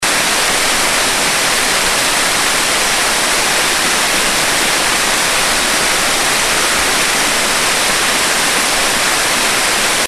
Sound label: Roaring Brook Falls